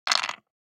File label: DICE on Wooden Tray, Throw and Roll, Standard, 5 Five Dice; Samples; ArticulatedSounds; DICE Sfx Party (Extended)